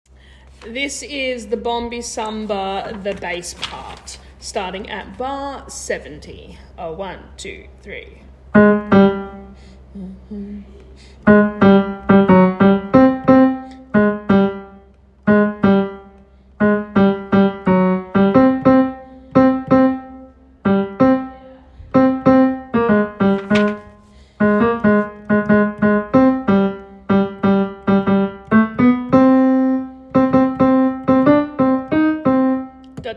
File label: samba basses